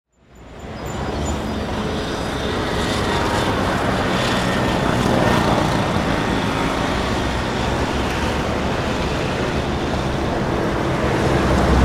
Ambience, European, City, Traffic; 5.1; Sound Effects:Science Fiction; BlastWave

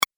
Multimedia Tiny Click; Click Sound Effects

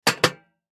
Footsteps Cowboy Boots Metal Surface Jump; Human; Foley

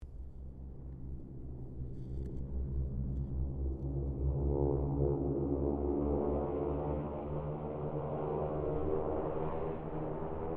Harvard squadron 4 pass, stereo; Mixed aircraft squadrons; Airplanes; Vehicles

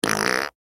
Human Mouth Fart; Mouth Fart Low Squeak Male Ten Yrs Boy; Blastwave

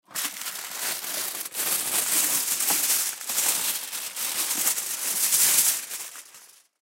Aluminum Foil Pull Sheet Out; Metal (Sound Effects:Materials); Blastwave; Foley

